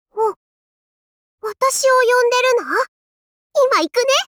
applause; osu

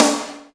soft hit clap; osu

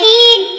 normal hit whistle; osu

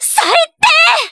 combo break

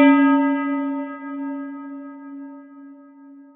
C#5 bell; osu

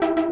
synth; osu

